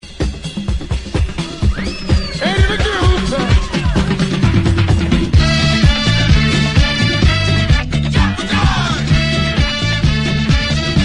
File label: disco; 70s songs